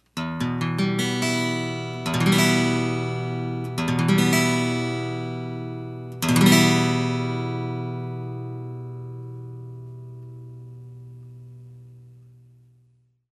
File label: guitar